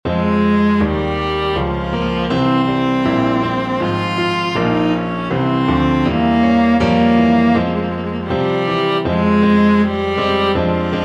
galliard sonata, viola

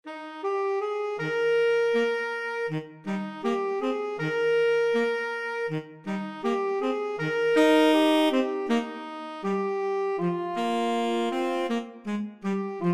2 tenor sax; tenor sax duet

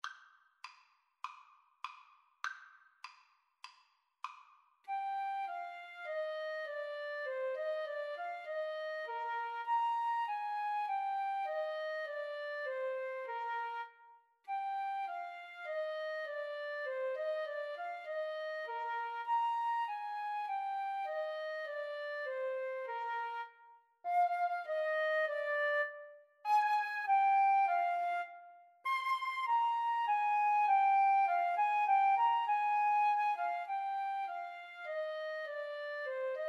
melody, Flute-Clarinet Duet

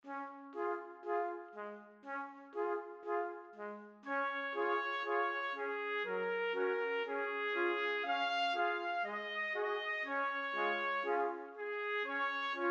trumpet quartet

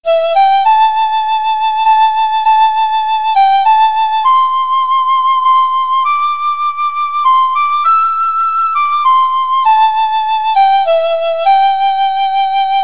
county down, accordion

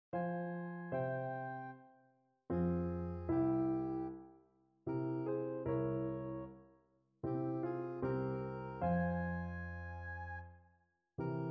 four seasons, soprano saxophone; sum 1st mvt

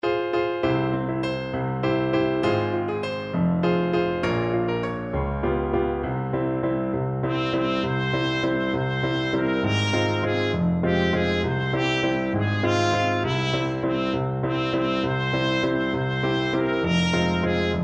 trumpet

